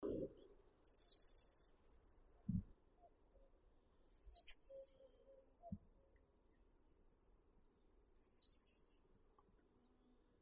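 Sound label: yellow-browed warbler, Phylloscopus inornatus; penochka zarnichka; zvuki prirodi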